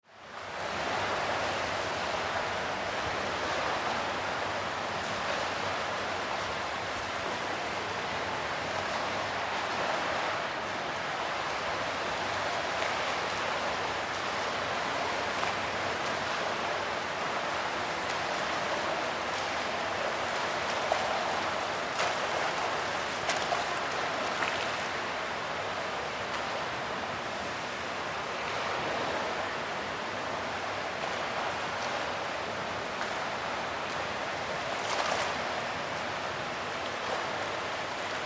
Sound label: Swimming Pool Ambience, Swimming Pool Olympic Size Distant Perspective; Water Splash Indoor; SPORTS; Blastwave